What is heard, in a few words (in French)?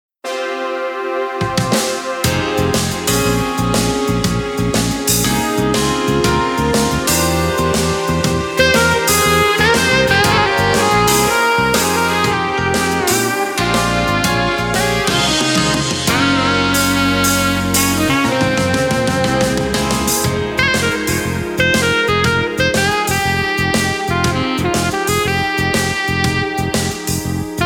Vibration intense, résolument d’influence Jazz; axiel-son